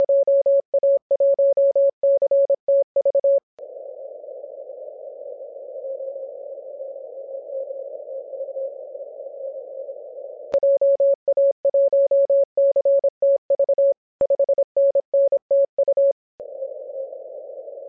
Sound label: 18mhz